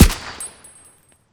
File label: silence; emx helios; rpk